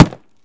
plastic; Escape from Tarkov

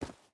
footstep boots dirt run; Rust

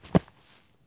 landing grass low; Escape from Tarkov

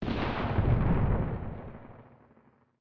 firework

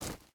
footstep hide gravel run; Rust

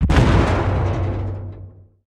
damage hits explosions, Warships

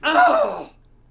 Death Vox; Civ4 warlords

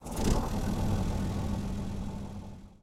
leo2a5 engine start, tanks; War Thunder

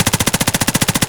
kedr loop silenced close; Escape from Tarkov

